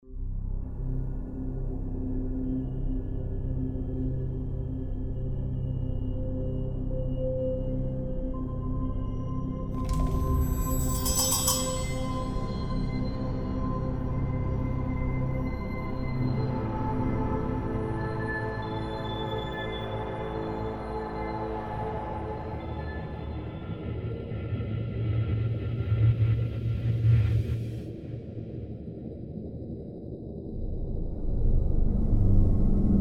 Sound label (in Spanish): TERROR